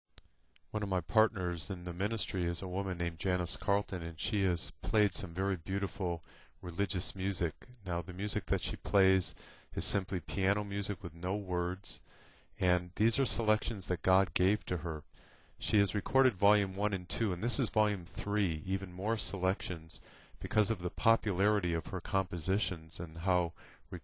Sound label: piano